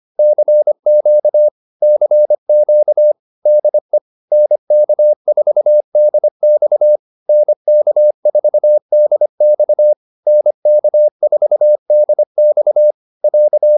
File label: QSO, intermediate practice files